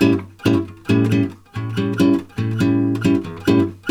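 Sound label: A 7 guitar, Brazil Bossa; USB Soundscan; AKAI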